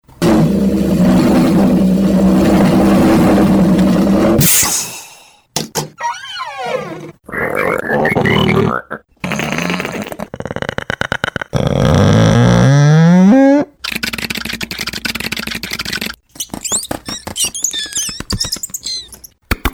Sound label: Various Sound Effects